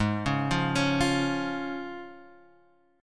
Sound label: C sharp Major Ab; arpeggiated; JGuitar